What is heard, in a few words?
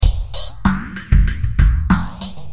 Vapour Break